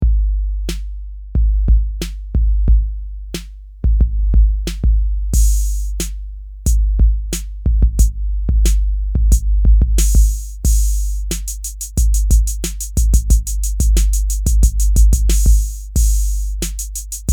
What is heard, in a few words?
techno mixdown